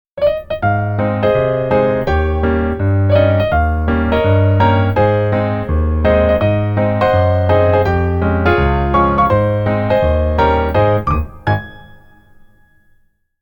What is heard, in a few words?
happy birthday jazz piano logo